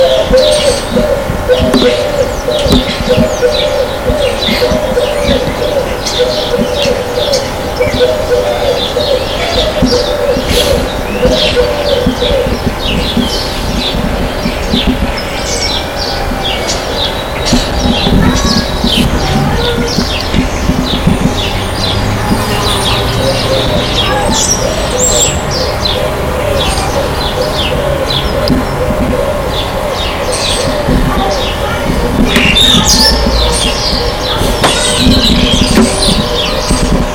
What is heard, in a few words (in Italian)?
uccellini; È in un parco non tanto lontano dalla scuola.; È un suono-rumore basso